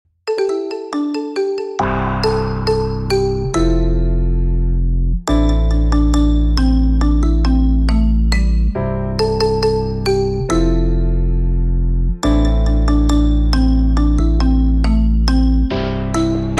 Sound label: Marimba Remix